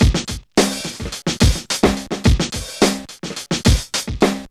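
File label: 107 bpm, Funky loops; Zero-G; Funky Elements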